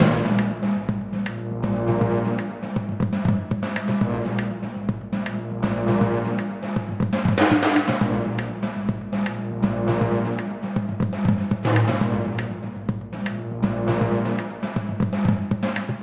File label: Chase Music; The Twins; Android iOS gamerip 2020